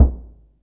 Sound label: LOW DRUM, BASS DRUMS; Zero-G Ambient 1